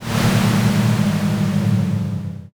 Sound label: sigh crowd